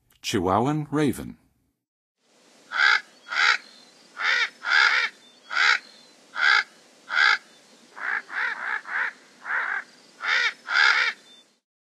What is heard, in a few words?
Chihuahuan Raven, Songs of Birds; North America; Western USA; Western USA Songbirds